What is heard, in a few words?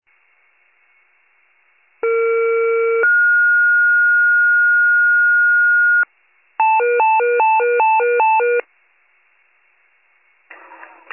fire, pager alert